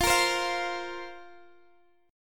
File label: F Suspended 4th F down Guitar Open G